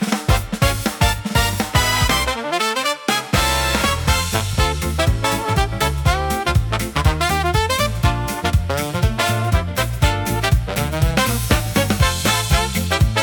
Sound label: Brass Bounce, electro swing pop